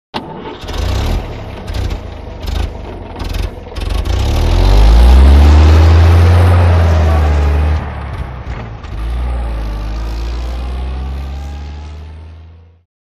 Automobile; Start / Away; Buick Start Up And Away, antiques; 1928